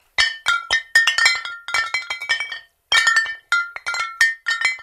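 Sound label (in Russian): звуки чоканья на празднике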